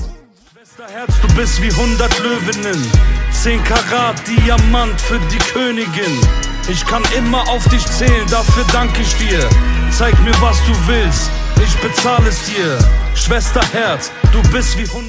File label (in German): deutsche rington